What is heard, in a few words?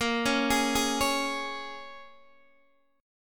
Bb Minor 7th Bb, Guitar, DADGAD; Bbm7 arpeggiated